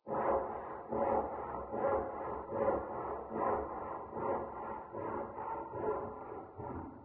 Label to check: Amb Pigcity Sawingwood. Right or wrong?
Right